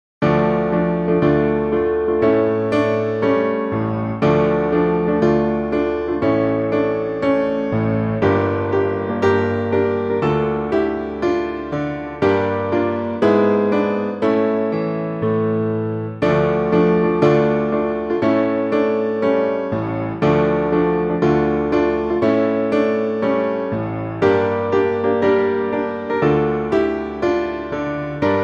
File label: Military March, Klavier; Militärmarsch